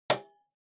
TV Hardware Switch

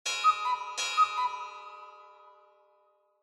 Cuckoo Clock Bell Reverb Mid

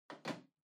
Portable Cassette Far Put

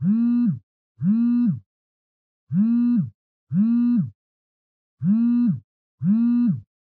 Cell Phone Vibration (Blanket)

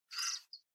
Portable Stereo Driving Noise CD Seek

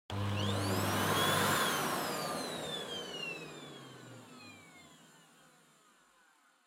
Vacuum Cleaner Vacuum Floor Soft High Still; 掃除機; バキューム 床 ソフト 高 静止

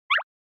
Motion Pop

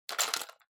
Pencil Case Rummage

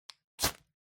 Flint Stick Strike

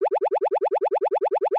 Motion Pop

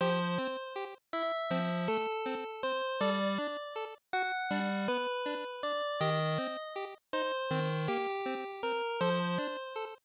Dungeon Loop; GB RPG; bgm

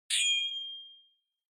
Flash Low Short; Onoma; オノマトペ 光る; 低 短

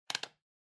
Calculator Switch

